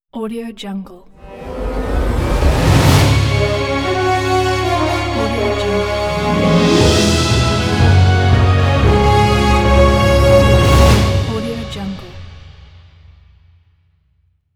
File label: audio orchestral logo